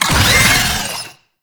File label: Boss Vocal Pain; Warframe; Oraxia